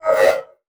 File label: round start; ufoai